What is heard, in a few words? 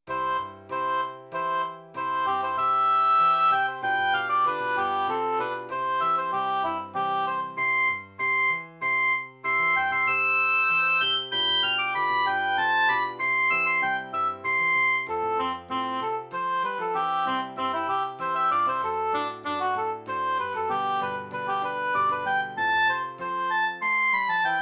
A Double Reed Fanfare; oboe